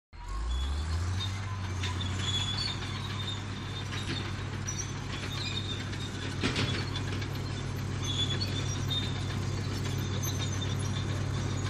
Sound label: Earth Mover; Earth Mover Start Up In Long Shot And Passes Mic From Right To Left